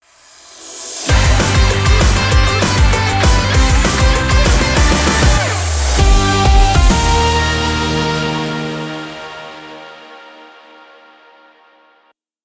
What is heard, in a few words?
ROCK U JINGLE; RADIO CHABLAIS